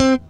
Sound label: FUNKY, Grooves